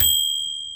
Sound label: ding